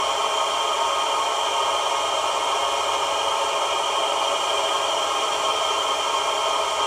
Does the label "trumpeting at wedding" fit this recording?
No